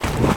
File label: geyser attack; Level2 Ice Fields